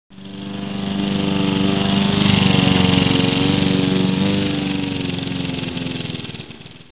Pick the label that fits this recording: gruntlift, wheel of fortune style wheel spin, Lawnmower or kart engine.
Lawnmower